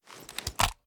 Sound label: reload empty fast end; reloads; iw8; uzulu; gmod